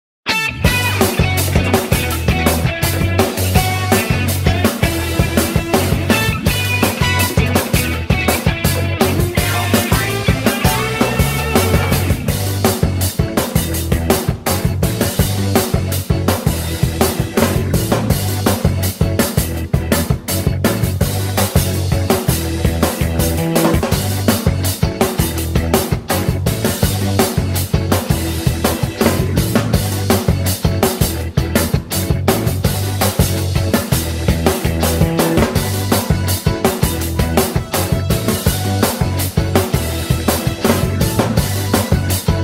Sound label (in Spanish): Tema de Victoria; Joker; PERSONA 4; SSBU